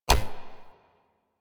UI Corpus Theme Drag End; DE; Warframe

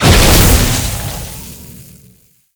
Enemy Spawn; Warframe; Man In The Wall; Enemy Gameplay

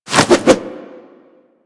Tackle End Foley; Manic

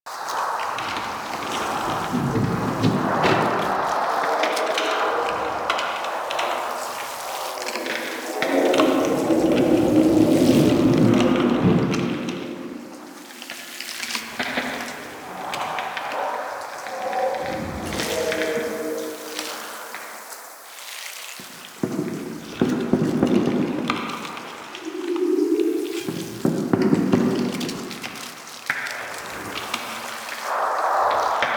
Exterior Valley Loop, Ambience; Warframe; Infested Eris

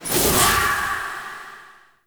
Ward Cast Stone Accent; Powers; Fey; Founders

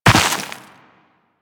Land Dirt, Footsteps; Warframe; Tenno

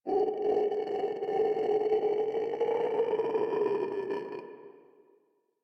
Infested Runner Patrol; Warframe; Runners

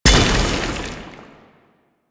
Staff Sand Attack Debris; Warframe; Duviri; Dax; weapons; player abilities

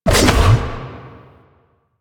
Bite Cast; Warframe; Warframes; Voruna

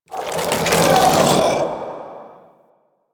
Tendril Grasp Cast; Warframe; Broken Frame

